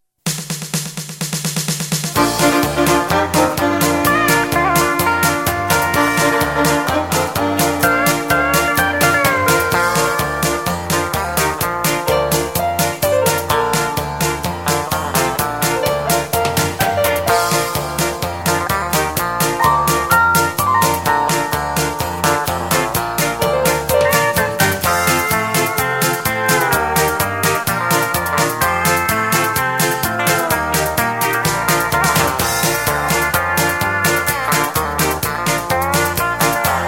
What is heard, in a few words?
music